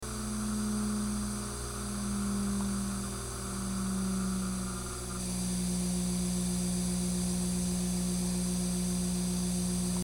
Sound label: noisy